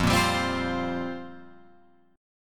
F Suspended 2nd Suspended 4th Guitar Open F, Fsus2sus4 chord; strummed; JGuitar